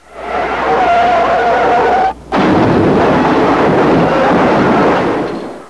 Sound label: Cartoon car crash